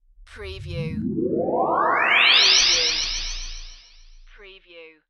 UFO Ascend Vintage

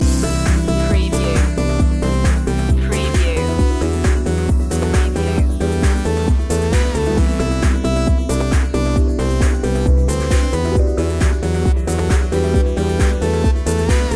ground pulsation loop

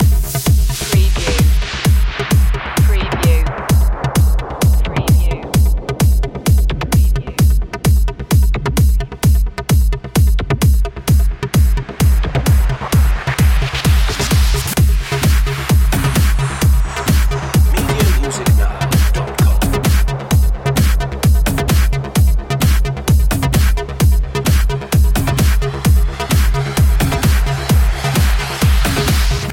crystal rain loop

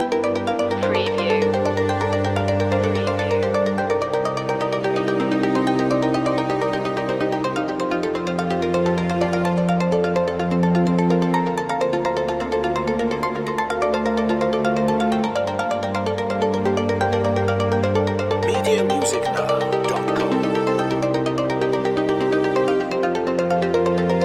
etherea long looping version no perc

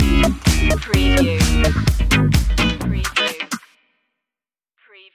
disco loopy stinger

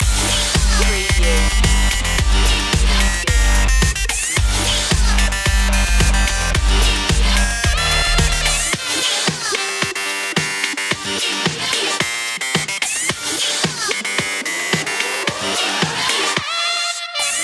french punk loop

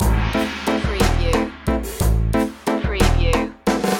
carnival of magic

